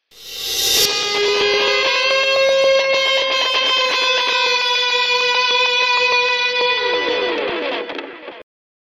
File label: Powerplant Stinger; Transformers: Revenge of the Fallen; PS3; Windows; Xbox 360